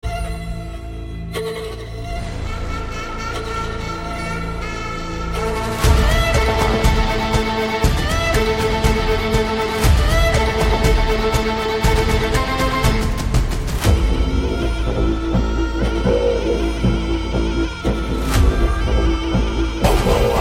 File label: Theme BGM, Background Score Music